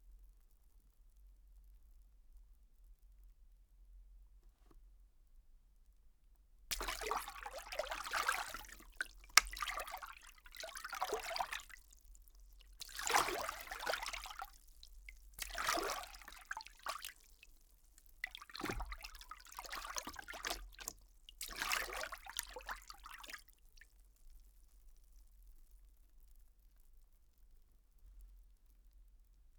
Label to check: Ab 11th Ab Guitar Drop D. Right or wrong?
Wrong